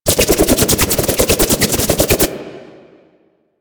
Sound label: Boomerang Loop; Warframe; Weapons